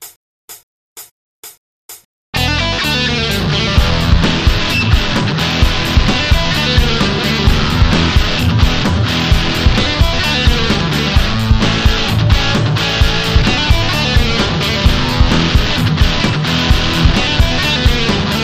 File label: blues